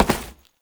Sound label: Step Default; Warframe; Oraxia; Foley